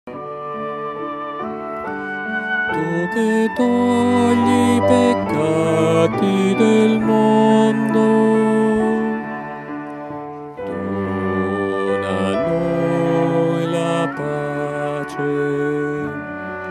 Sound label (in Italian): agnello di dio, bassi; concordia